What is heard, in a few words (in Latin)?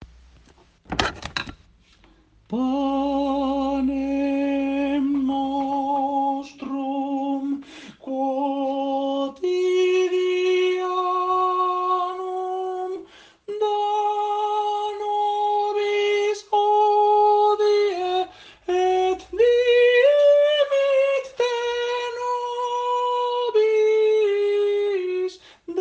Pater Noster Altos